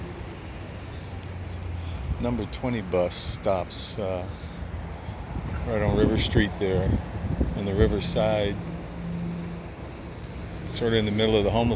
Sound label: river st bus stop; The Bus – Waipahu to Chinatown